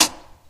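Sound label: syringe projectile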